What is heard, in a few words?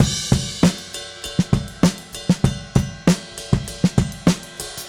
Smooth Groove Drums Crash, 70s Analog Funk; Sonic Foundry (Sony Creative Software); What it Is!